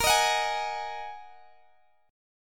Bb 7th Suspended 4th Sharp 5th Bb Guitar DADGAD, chord; x,x,20,20,21,18